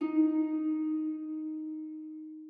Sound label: harp